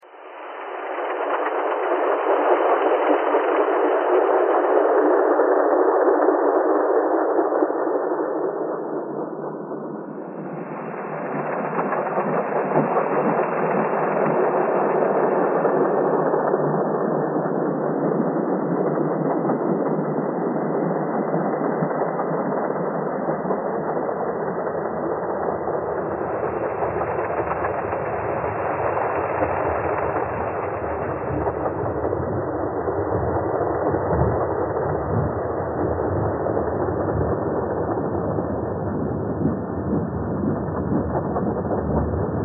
train thread all

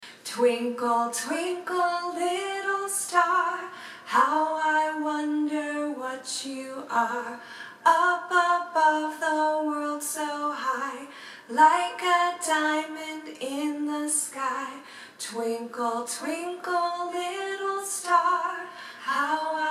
twinkle twinkle little star

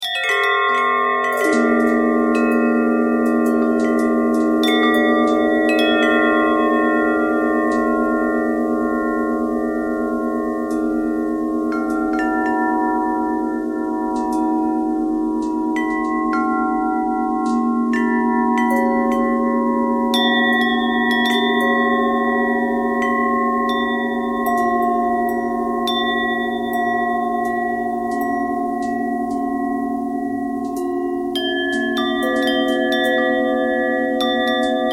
bell bell thread noise, bells

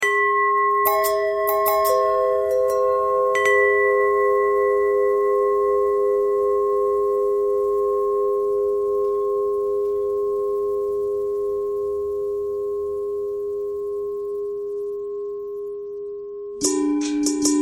long bell bell thread noise; bells

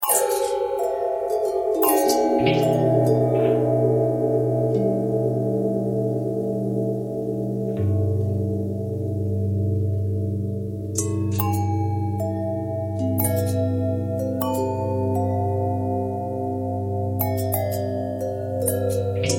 long bell long bell twist, bells

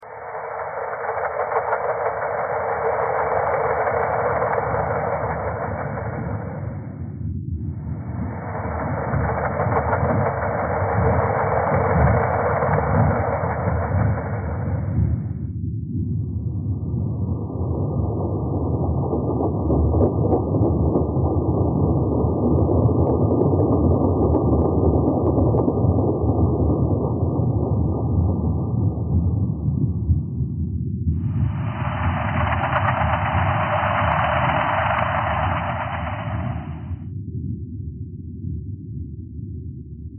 thread pentatonic

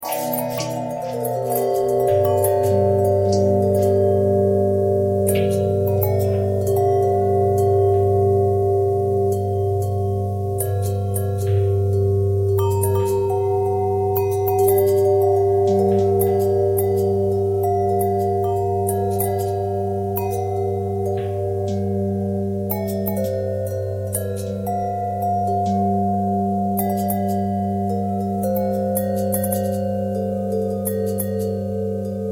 bell long bell bend twist, bells

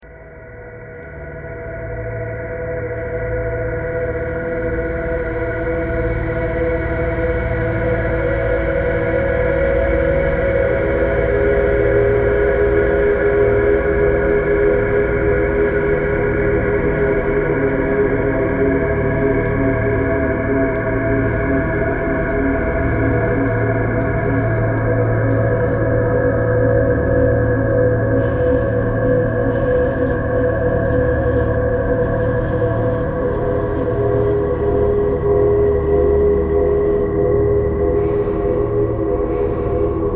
traffic core birds thread simple

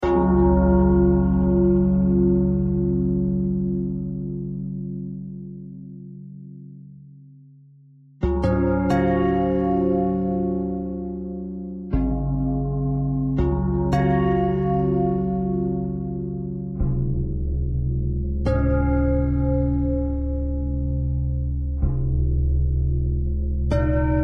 bell thread

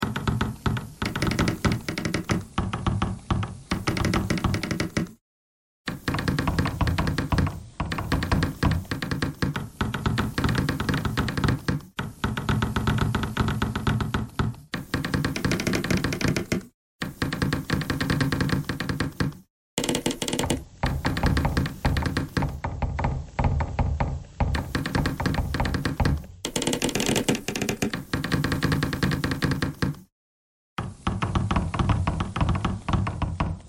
bagpipe thread noise

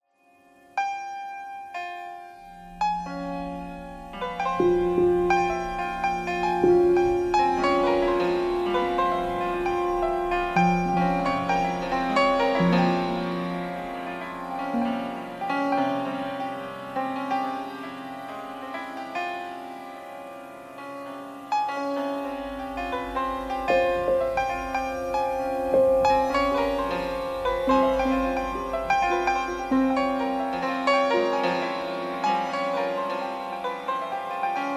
piano noise

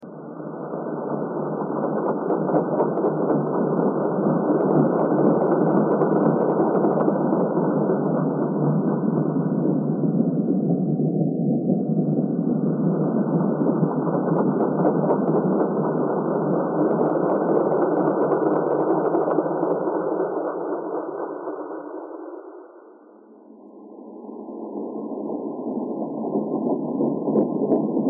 thread noise

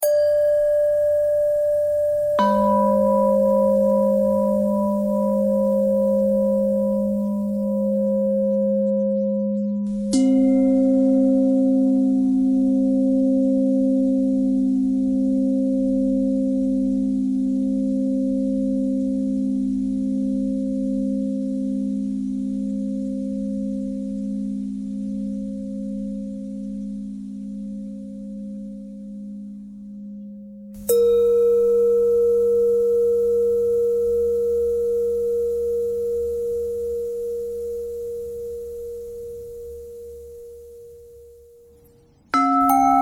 bell thread pentatonic

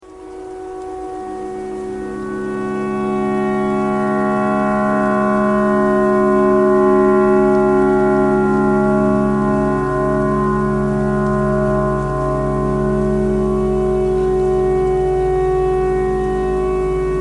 clarinet tornado siren simple all

